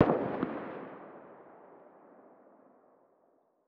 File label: m249 attack far distant; Rust